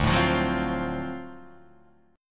D 7th Sharp 9th D down Guitar Open D

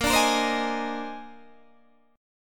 Bb 11th, Guitar, Open D

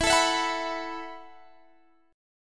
E Suspended 2nd Suspended 4th, down Guitar Drop D

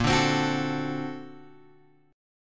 Bb Augmented Major 9th, Guitar, Open F; 10,9,12,13,12,x; Bb+M9 strummed; Bb+M9 chord diagram; JGuitar

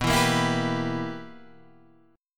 B Major 7th Suspended 4th Sharp 5th, chord; BM7sus4#5; strummed; JGuitar

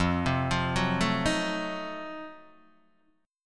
F 7th Flat 9th, Guitar Open D

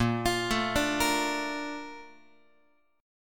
Bb Major 7th, Bb, down, Guitar; BbM7 arpeggiated; JGuitar

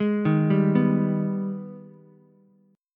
Ab Minor Major 7th, down, Ukulele; AbmM7; arpeggiated

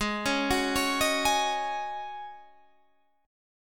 G sharp 7th Suspended 4th G sharp, Guitar Drop D

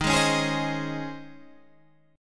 C sharp Major 7th Suspended 2nd D sharp

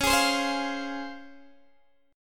C sharp Major 7th C sharp Guitar Open D

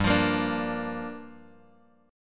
G Minor 7th, Guitar, Drop D

